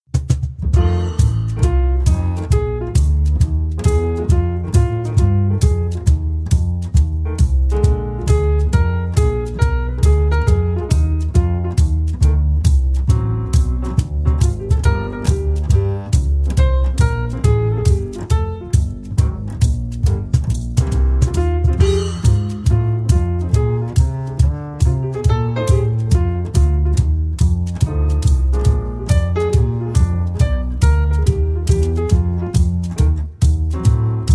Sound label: Blues; Empfang Dinner